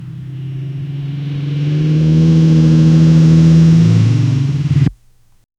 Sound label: short motorbike revving o; vehicles